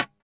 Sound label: G#3 A funk chord, guitars; Spectrasonics; Hans Zimmer Guitars VOL-1; 90's Sample CDs